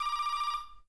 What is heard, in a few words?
Windows Vista Ringin